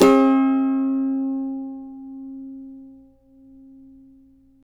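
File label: D minor, Guitars; Spectrasonics; Hans Zimmer Guitars VOL-2; CAVA CHORDS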